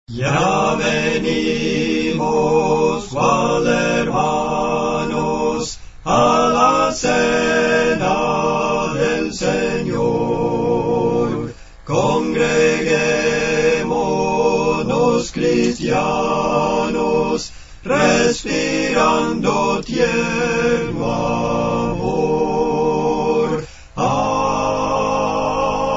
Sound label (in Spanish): 4 Voces, Cantos Espirituales; Las cuatro voces; 029 Ya Venimos, Cual Hermanos